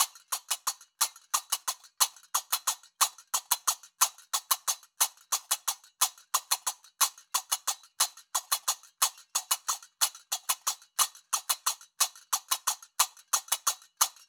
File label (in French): loop ternaire tempo 120; qaraqab; qraqeb; Maroc